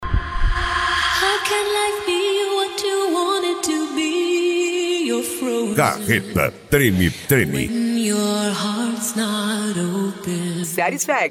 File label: funk mega bass